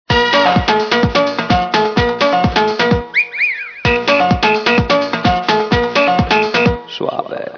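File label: Latin, ringtones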